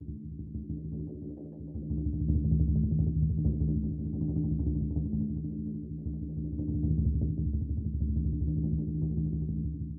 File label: music hideout; Hideout2; Gameplay State Neutral; Drums; Sifu